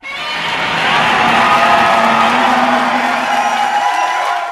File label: Rave Transition